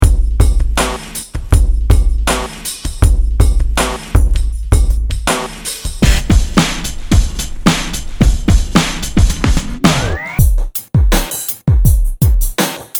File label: Loop; Hotbox V1